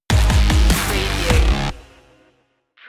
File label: hybrid human stinger